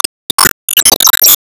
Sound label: Warcry